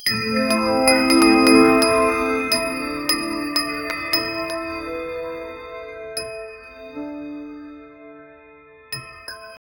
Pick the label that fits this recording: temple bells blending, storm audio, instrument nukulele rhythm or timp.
temple bells blending